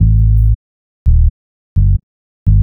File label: Smooth Bass; Sonic Foundry (Sony Creative Software); Knutrix Drum & Bass